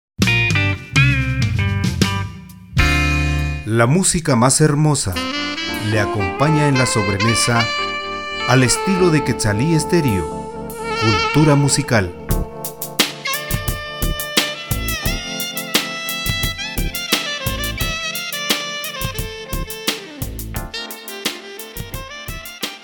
spot la musica mas hermosa instrumental sobremesa

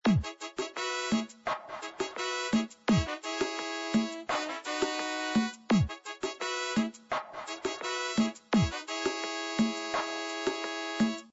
musica sin copyright royalty free music

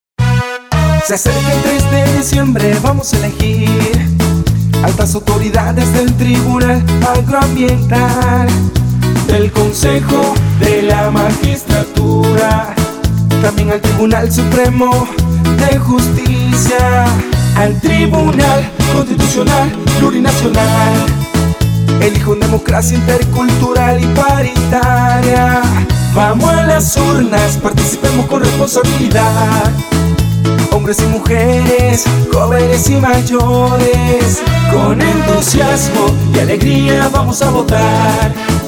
jingle con sello

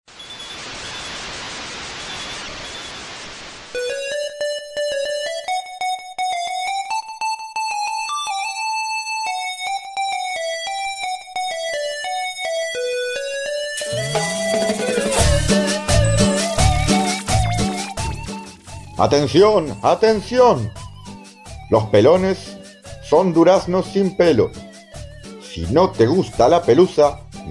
cumbia

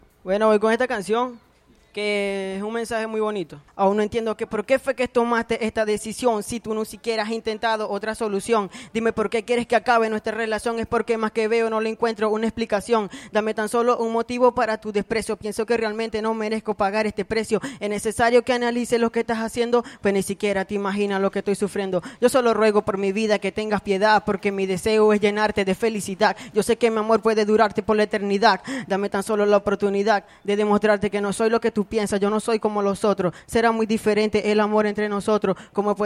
acapela; turen

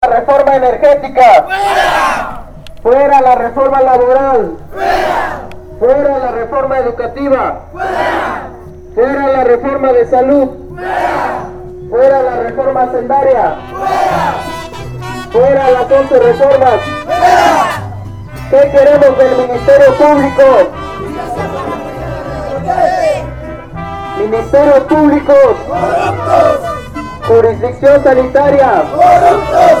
gritos de consignas

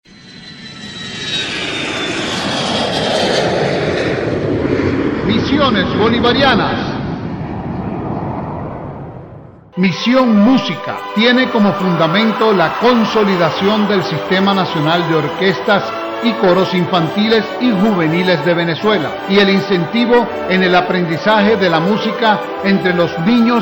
música

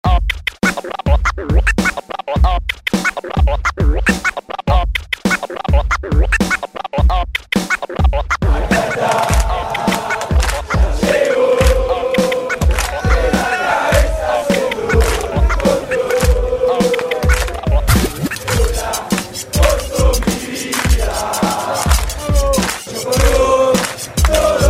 viola mix

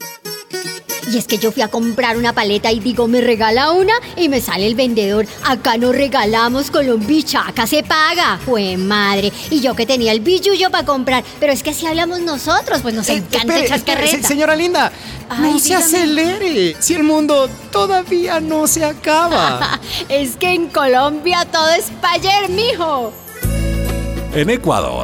spot ritmos distintos

